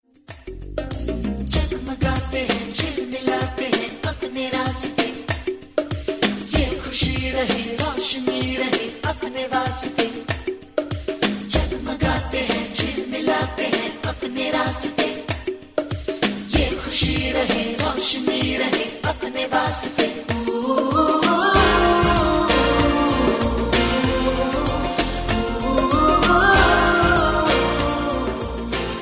chorus; Indian

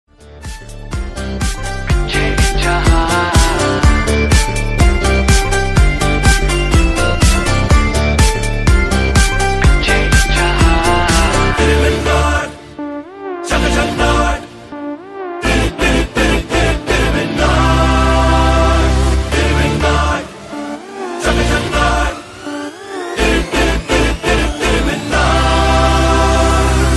bg tone

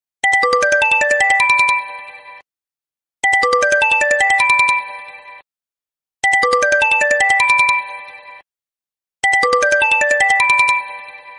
Sony Ericsson ringtone

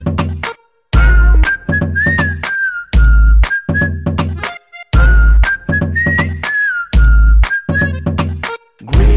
rocket whistle; Indian

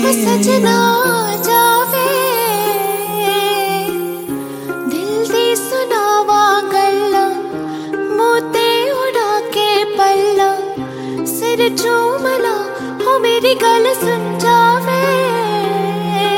female; Indian

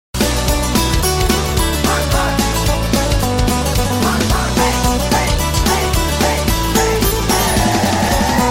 guitar; Indian; Delhi Belly

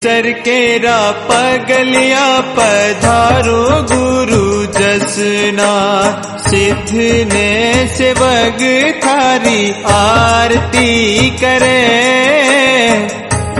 devotional tune

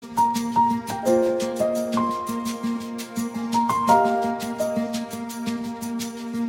delicious piano

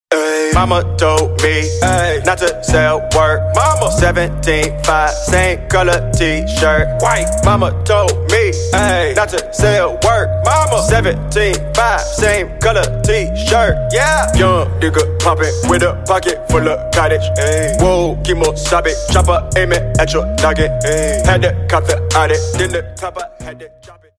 t shirt ringtone

